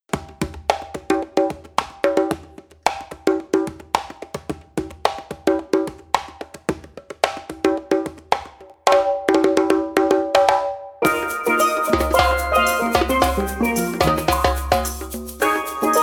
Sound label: AFRO BEAT